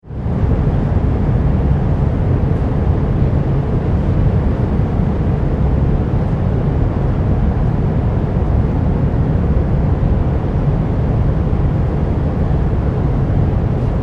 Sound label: Ship Large Ferry Deck Atmosphere Stationary; Tom Hutchings